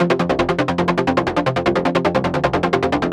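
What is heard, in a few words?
fast, fast sequence; Music Store; Yamaha VP-1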